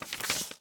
book turn

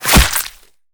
flesh, smallclaw combat abilities

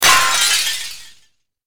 breaking glass

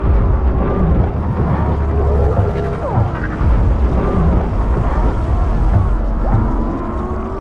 repair loop, vehicle; Cosmic Rage

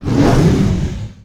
hurt, dragon; combat; creatures

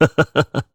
male chuckle; Gendered social sounds

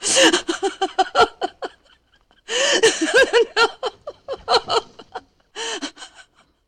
sob

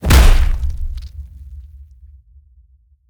flesh; CosmicRage; combat; bigtail

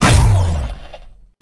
hurt, cylarkan she; combat; creatures; CosmicRage

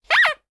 squeak, toys; CosmicRage; CosmicRageSounds

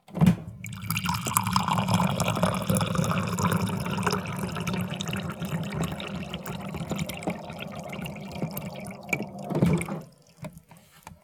watercool, house

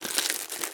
vending; Cosmic Rage

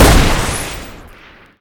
shoot, combat; kick sounds

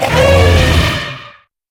hurt, enemy, combat; CosmicRage; CosmicRageSounds; baurg

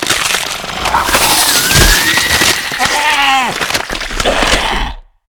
transform; ships; CosmicRage; ratika

